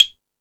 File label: Percussion, Drum Machines E-MU Pro-Cussion